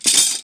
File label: tools, wood metal; wood metal SFX